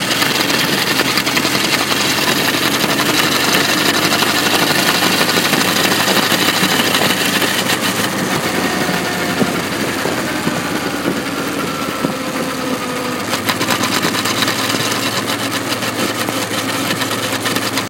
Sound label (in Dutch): XL600V maakt een zorgwekkend geluid in het vooronder; Transalp; phone in hand